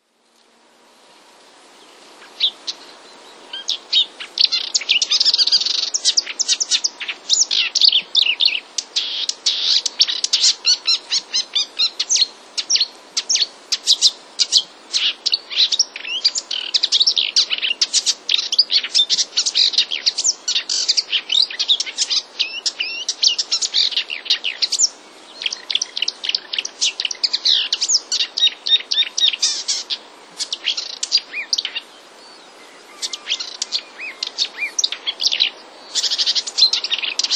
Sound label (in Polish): lozowka, śpiew pewnego samca łozówki; Łozówka jest małym ptakiem śpiewającym, który w Polsce nie zimuje.; wroblowe; zapozyczenia